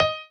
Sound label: piano melo